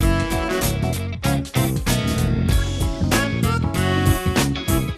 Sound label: horiz scroller